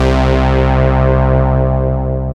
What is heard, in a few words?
BAS, Eurotech; Sounds Good